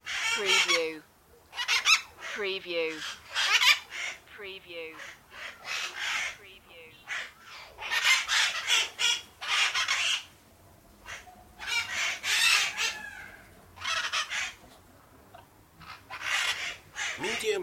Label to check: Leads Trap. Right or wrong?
Wrong